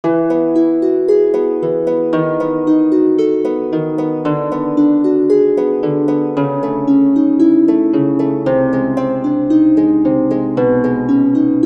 Harp

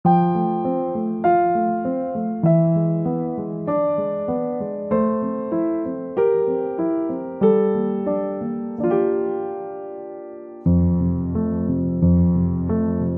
piano

